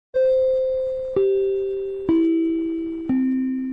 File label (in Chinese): 小学下课铃声; 广播室铃声系统